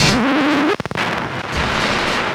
X SCRA R, RECORD-FX; XP RECORD-FX; Best Service; XXL Production Effects

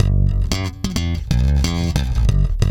SFNK G#, Bass, FUNK; Spectrasonics; Bass Legends

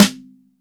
MIX SN; DRY STD SN

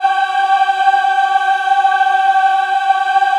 G4 MW AH, Choir; USB Soundscan vol.28; Acoustic & Synth; AKAI

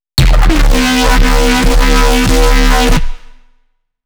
reese D

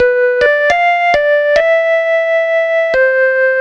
2 Step 133 SYN, House; USB Soundscan vol.51; House Side Of 2 Step; AKAI

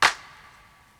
Clap Break Lo; Lost Tapes From Mars; Clap & Rim

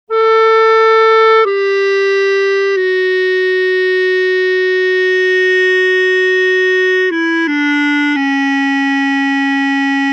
90 D maj Clarinet, Orchestral arrangements

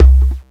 Jomox Xbase888

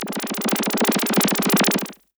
Fast Blasters

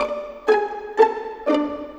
Pizzicato, Orchestral Series Rock and Pop; Sonic Foundry (Sony Creative Software); Orchestral Series VOL-4 - Rock and Pop

